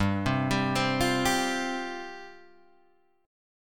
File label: G 7th Suspended 4th G down Guitar Half Step Down